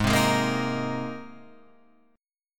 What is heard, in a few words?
Ab Major Add 9th, Abadd9 chord; Abadd9 strummed; JGuitar